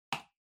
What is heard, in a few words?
PC Mouse Far L Hard